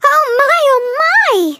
flea hurt

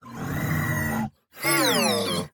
irritated beep; 守望先锋2; 堡垒; 局内触发; 恼怒的哔哔声

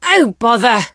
hit; rosa

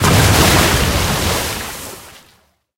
bubble burst; hank

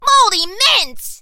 hurt

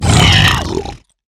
hoglin death, 疣猪兽：死亡; Minecraft; 疣猪兽是一种生成在绯红森林的攻击型生物。它们是目前唯一可繁殖的攻击型生物。; 疣猪兽（我的世界）

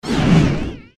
dryfire

